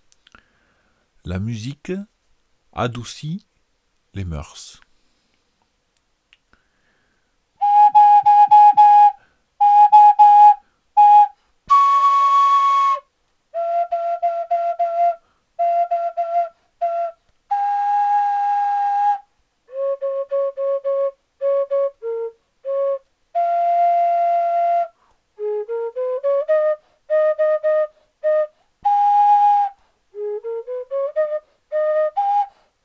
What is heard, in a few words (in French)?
la musique adoucit les moeurs